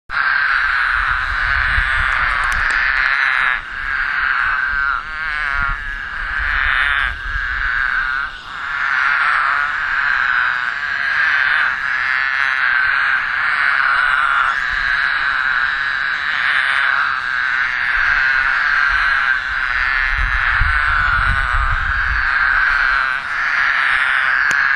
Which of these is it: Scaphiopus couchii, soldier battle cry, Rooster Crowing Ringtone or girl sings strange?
Scaphiopus couchii